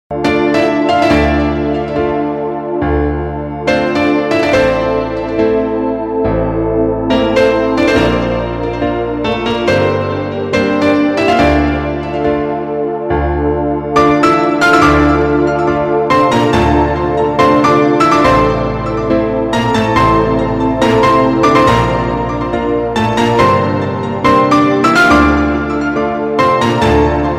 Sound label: Ringtone (keyboard)